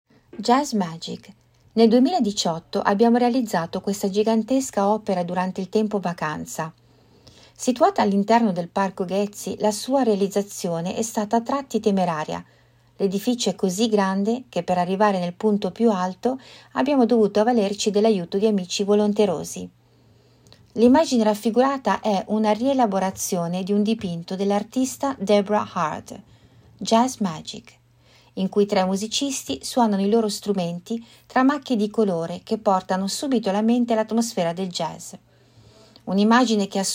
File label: jazz magic